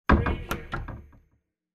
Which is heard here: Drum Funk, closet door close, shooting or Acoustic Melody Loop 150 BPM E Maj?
closet door close